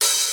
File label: Hihat